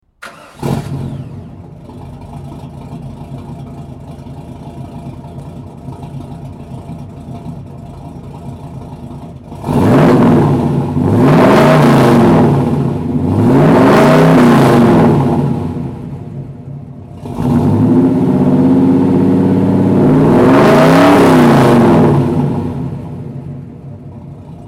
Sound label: thrush Rattler